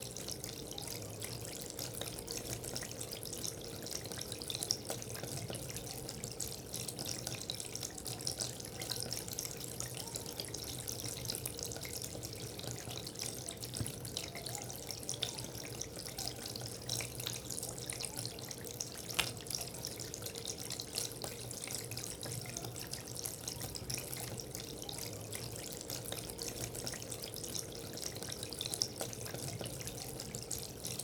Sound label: water stream